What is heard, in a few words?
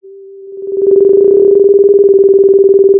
pop, Sound of a phone ringing